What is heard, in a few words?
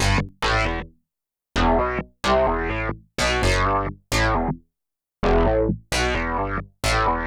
132 House Arp Emaj; Dance DJ; Synth; Arps; Pro Tools Essential Loops; Digidesign